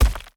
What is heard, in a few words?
Bone Golem Step; TANK; 3Souls